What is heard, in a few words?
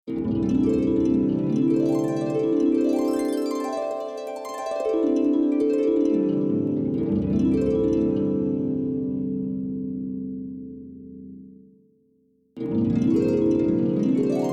Arpeggio